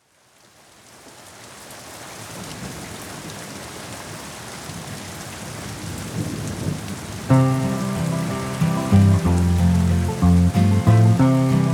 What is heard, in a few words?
Rain (Instrumental); Bluey; Dance Mode!